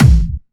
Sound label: Distortion Kicks, Bassdrums